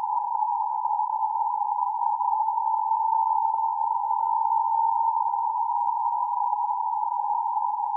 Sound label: raft beacon; pe1itr; satellite